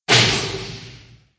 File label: Exit Atmosphere